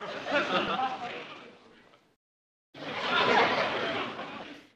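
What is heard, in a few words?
Crowd 40 Medium Bursts, small int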